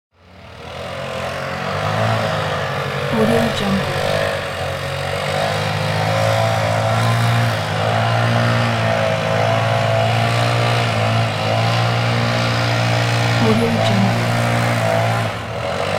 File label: leaf blower